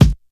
focused kick drum single shot G sharp key

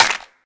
clean hand clap a sharp key; single hits one shots

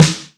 low acoustic snare sound D# key

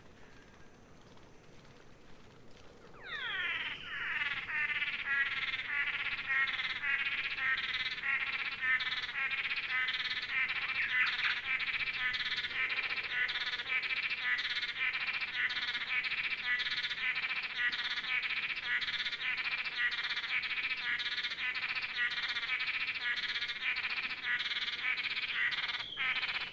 blue-backed manakins calling

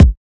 puffy kick drum a sharp key; Mono; kick drum samples

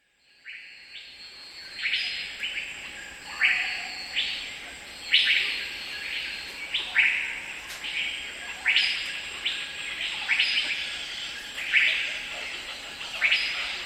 pileated gibbons - bluebirds

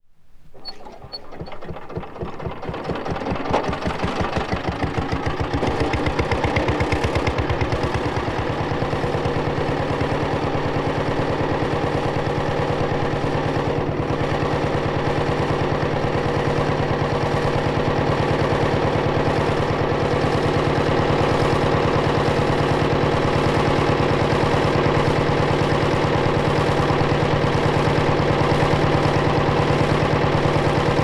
single stroke petrol engine start; mechanical

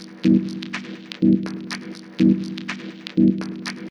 techno curly mid synth loop; synth loops

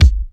nineties thumpy hip hop kick drum sound g key

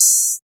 light open hat one shot A# key

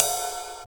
cymbal sound f key, Loudest frequency: 6288Hz

